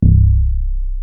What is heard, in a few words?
Sub Sonic Bass C2